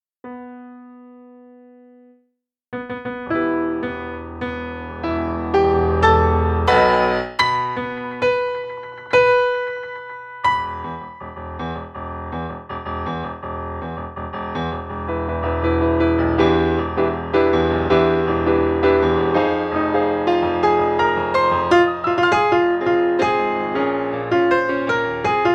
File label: Melody; hocuspocus; Audition Cut